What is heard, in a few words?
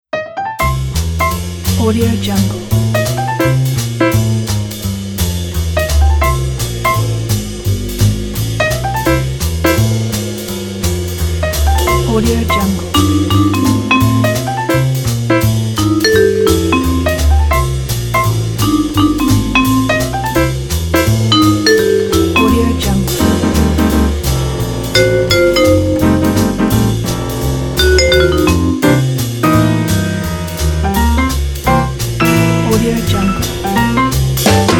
jazz under the stars